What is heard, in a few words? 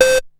TONE; SoundFactory; SMPMANIA